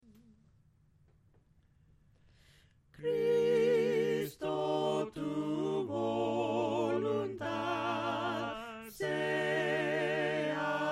himnos a capella; CRISTO TU VOLUNTAD; cantos del camino

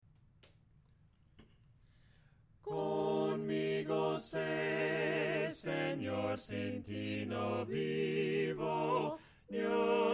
himnos a capella; cantos del camino

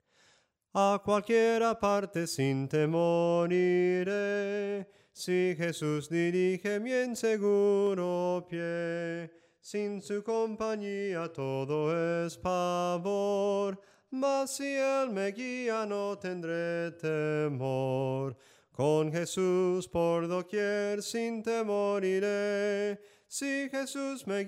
SOPRANO CANTADO, himnos en melodia soprano

